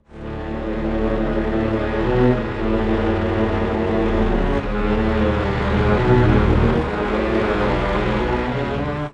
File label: strings; pitchshift; prosoniq time factory dirac